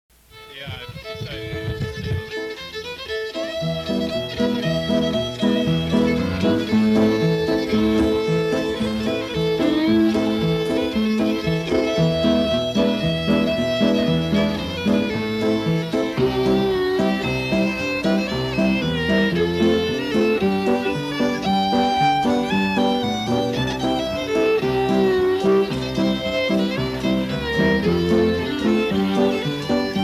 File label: Cello, fiddle tunes